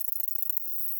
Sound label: song, katydids, neduba; neduba radocantans